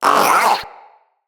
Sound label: Zanuka Companion Vocal Pain; Pets